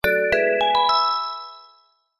multimedia alert musical warm finish complete success